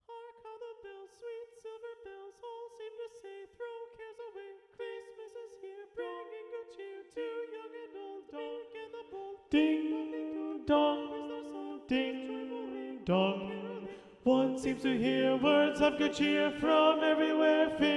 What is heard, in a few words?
Carol of the Bells SATB Tenor Predominant